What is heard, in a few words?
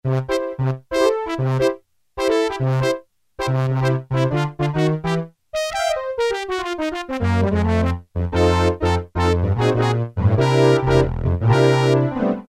big band brass, Technics SX-K450 Voice Combinations